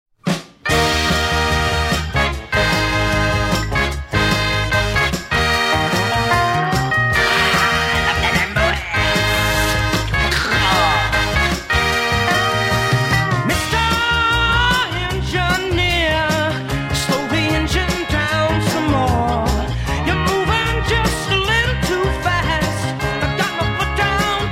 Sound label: Steam Engine alt vocal take